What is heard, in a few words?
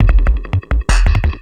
Beats, Industrial